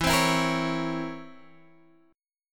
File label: C 7th Flat 9th E